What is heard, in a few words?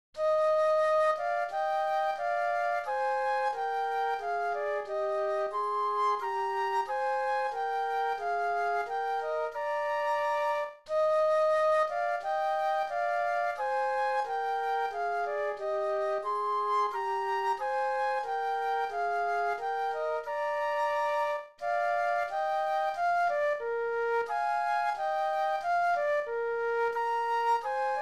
Flute Duet